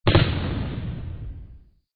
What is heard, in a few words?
firework good